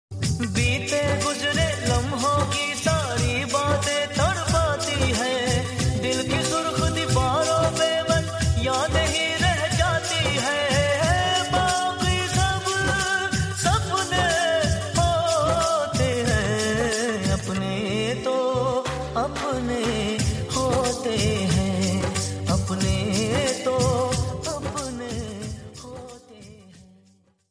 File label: emotional hindi